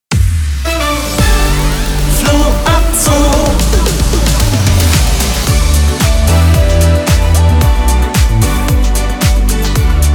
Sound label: Daytime Opener; Hamburg 2